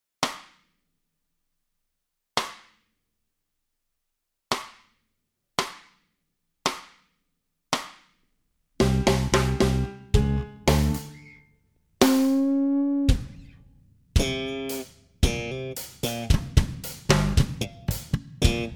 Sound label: Bass Solo Slow Base Basso